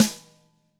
DW hit; ILIO

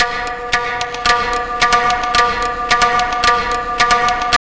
Tabla, 110 BPM; Zero-G; Pure Tabla

